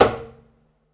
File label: pizz noise body slap, double bass